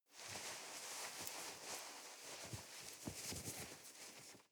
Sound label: nylon leather very soft